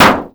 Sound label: All Explosion